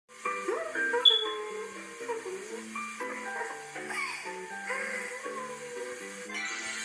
shower; garnet; jewelpet; taking a nice shower

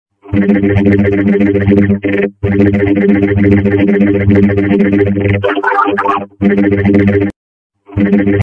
robot spam

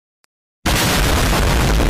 super duper explosion

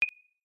clave

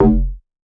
bonk bass

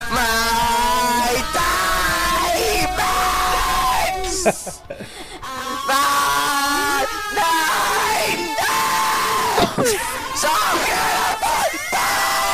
singing

